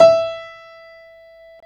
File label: Piano Grand; CMI